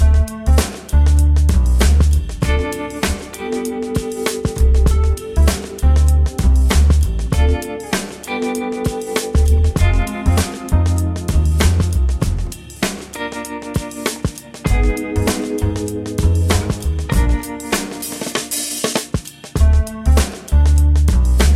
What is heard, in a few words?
Lounge Jam; Super Fancy Pants Adventure